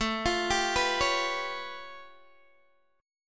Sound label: A Augmented 9th A Guitar DADGAD